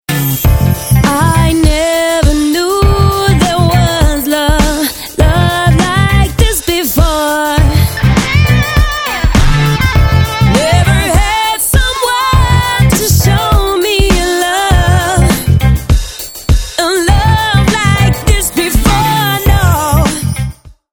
duo electric

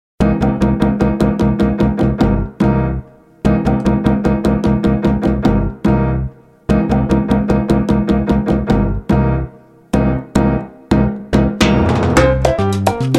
trio

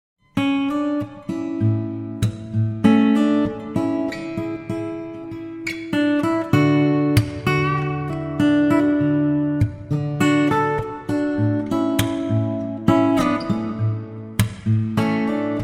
guitarist

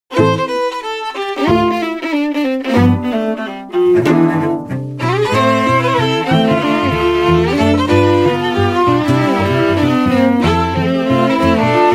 strings

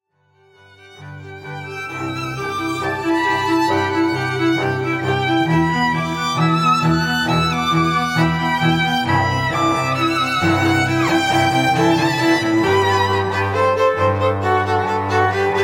strings; classical musicians

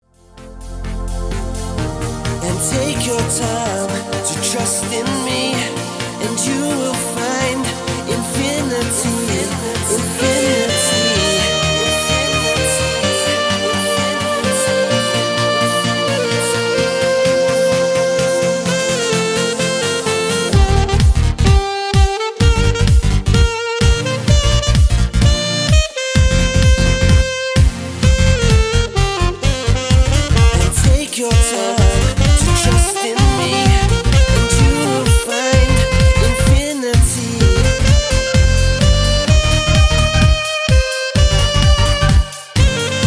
sax

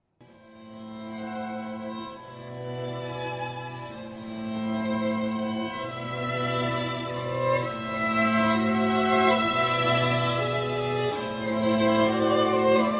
strings trio

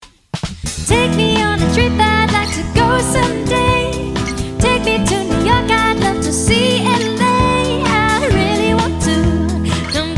acoustic, wedding band

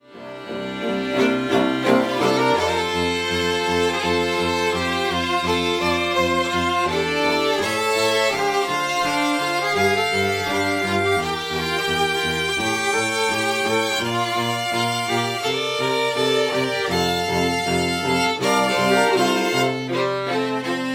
strings

